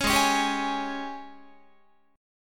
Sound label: G sharp Major 7th Suspended 4th, Guitar